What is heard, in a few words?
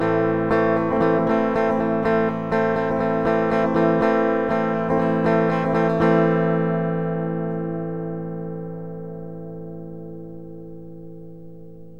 Am Rythm chord 120bpm